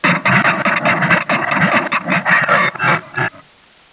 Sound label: zombie idle; svencoop